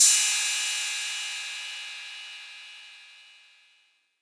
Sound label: crash f key; Cymbal drum one shot samples